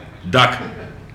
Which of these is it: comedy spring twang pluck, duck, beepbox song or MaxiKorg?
duck